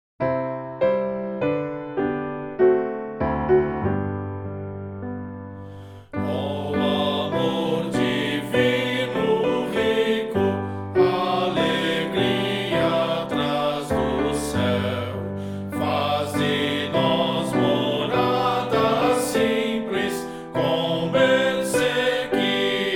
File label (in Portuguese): voz piano